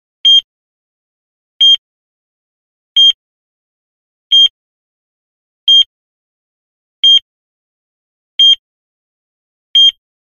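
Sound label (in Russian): звук кардиомонитора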